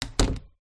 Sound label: household door internal modern close